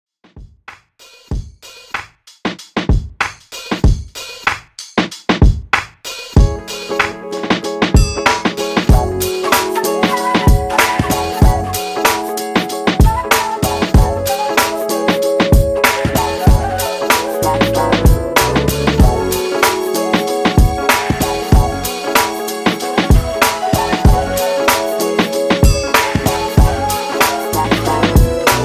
90s RB Redrum 95Bpm

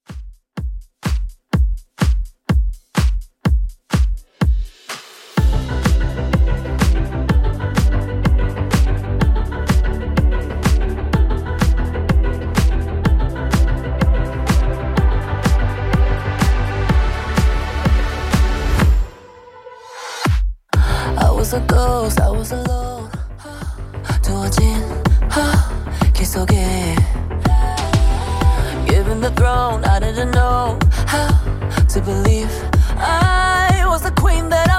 Top40 Redrum 125bpm

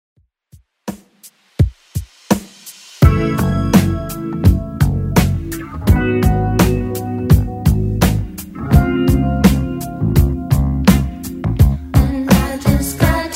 70s, 84 bpm; Redrum

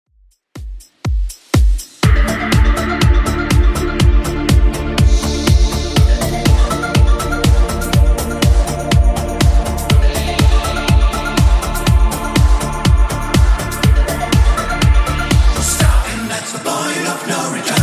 80s Redrum 122bpm

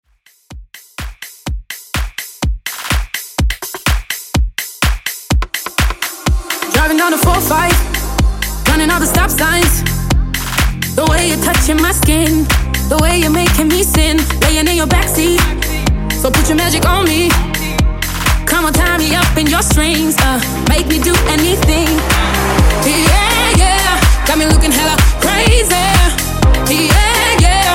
Dance House 125 Bpm

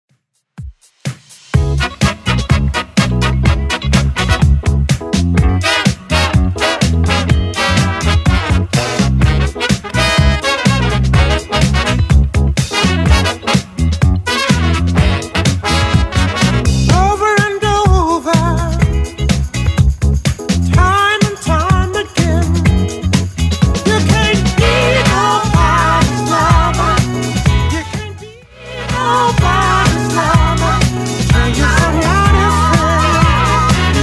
70s Redrum 125bpm

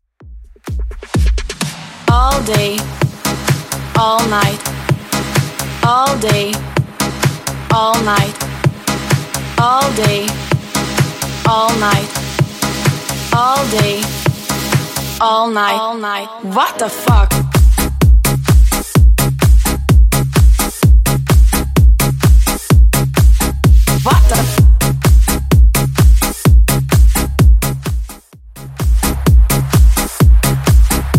2ks Redrum 128bpm

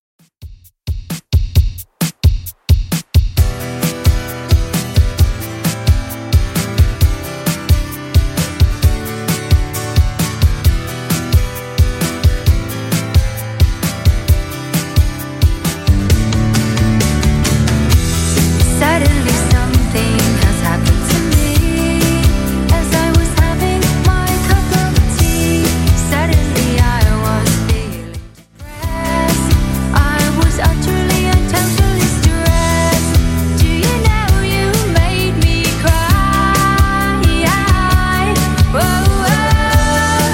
90s Redrum 132 bpm

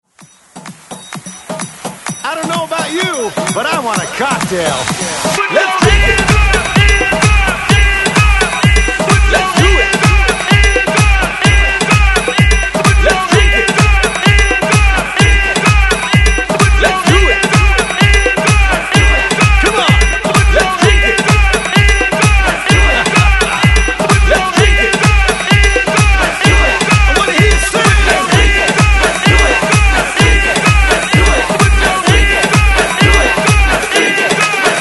Breaks 128 Bpm

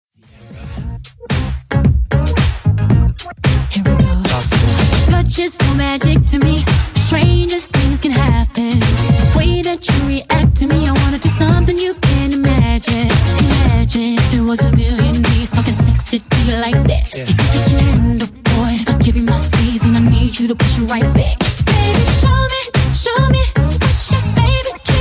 2ks Redrum 112bpm

